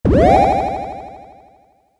Pickup: Generic Pickup SFX For Collection Of Item, Video Game; Video Games; Stuart Duffield